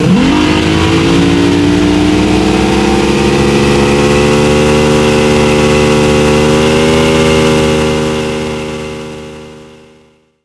zodiac reverse rev

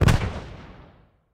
apache bullet hit layer

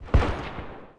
polycraft 1 shot echo

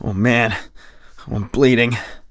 bleed in; Escape from Tarkov; usec2

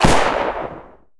explosion smoke; cannons; War Thunder

